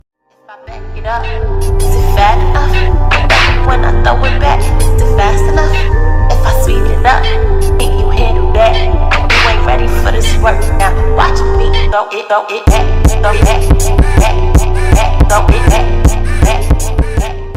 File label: Kawaii Vibe